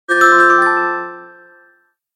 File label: Ps4 message tone